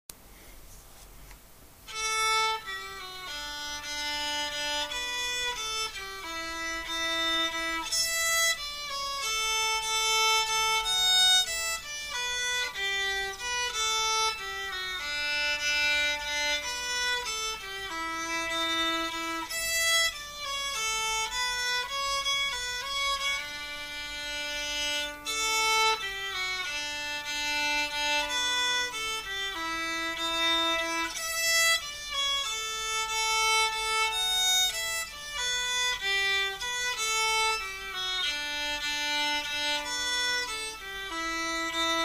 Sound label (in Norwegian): Martnaspols langsom